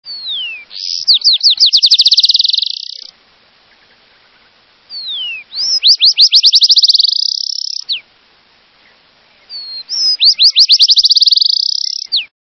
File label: Black chinned Sparrow